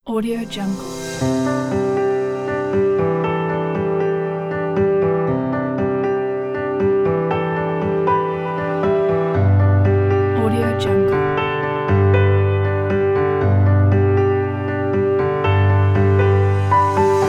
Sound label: motivational romantic piano journey story